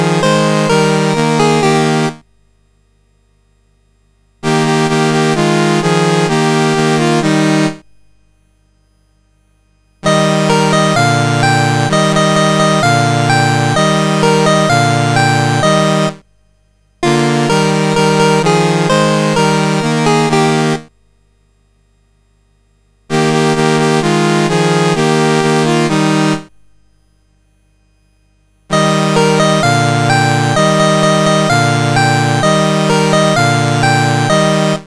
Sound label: CSG8580R5, National Anthems; Qatar